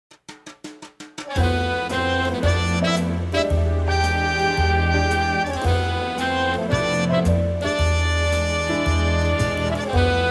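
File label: F strings Horns, male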